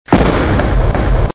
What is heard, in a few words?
explosion